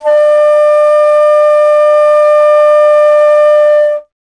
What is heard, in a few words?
Alto Flute